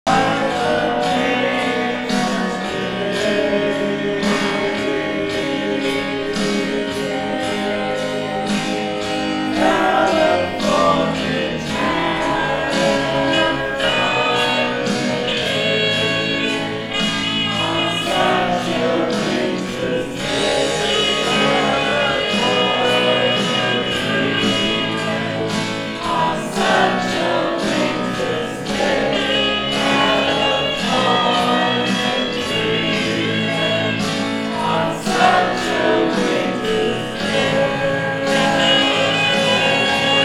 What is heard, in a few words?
Last Sax Solo Slow